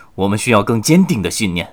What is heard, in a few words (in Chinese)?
失败, 年轻的修士; ycrpg